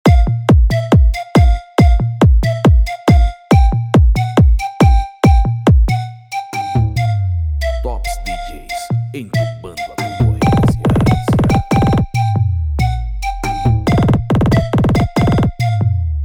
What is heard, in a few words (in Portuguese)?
flauta pancadao automotivo